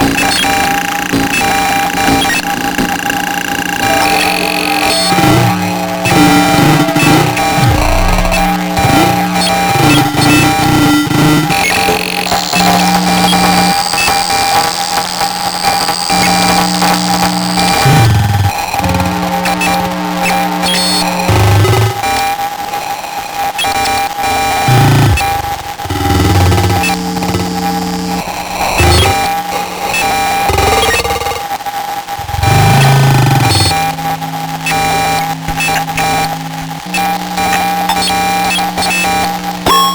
alien radio

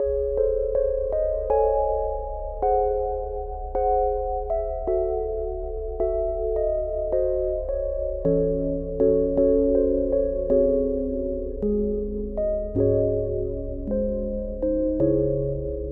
game over

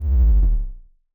ghost gone

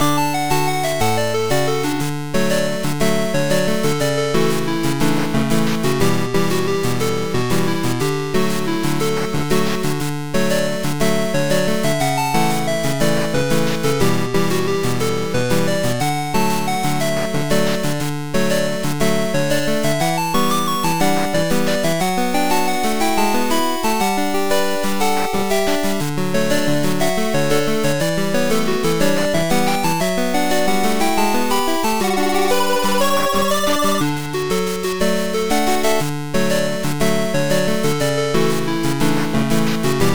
8 Bit Overworld Theme No Drums

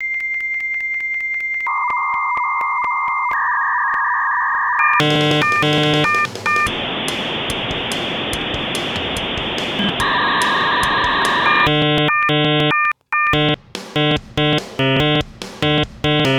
dialup song

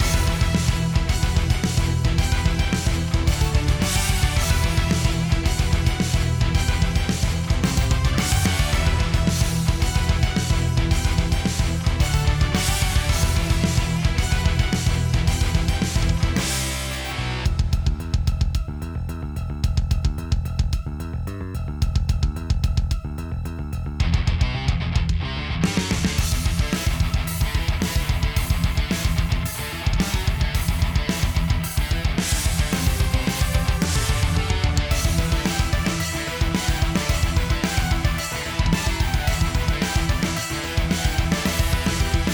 Boss Battle Metal

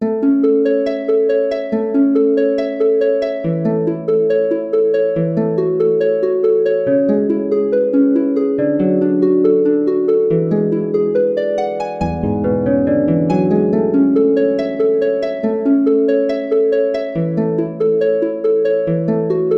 prelude in c major style (harp)

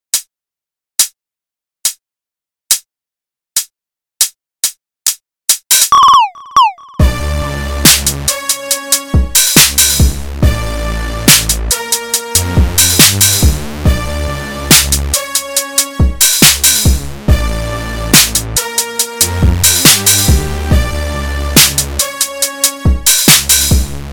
why pop; vintage collection vgm sfx tracks stuff vol ii

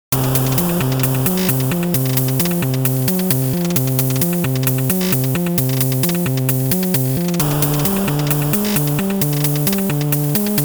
16bit Bee

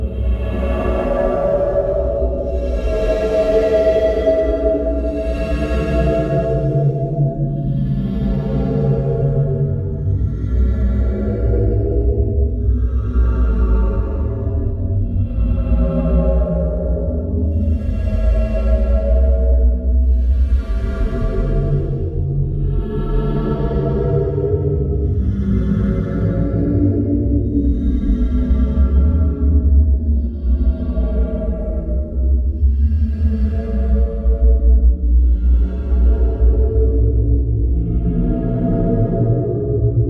drone, Droning Sound Effects

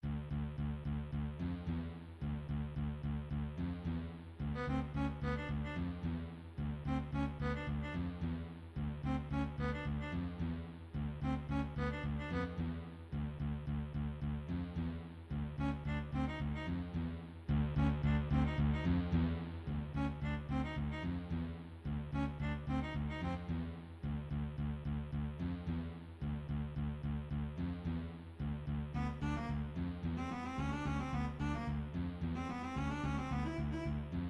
menace

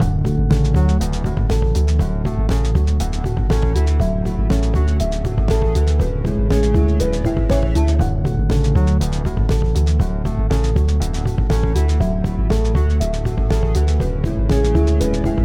daylight stinger

